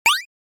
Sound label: jump